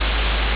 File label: ambience room tone